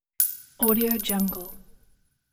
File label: spent shell casing chunky reverberant